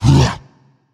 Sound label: grunt jump; smite; kuzenbo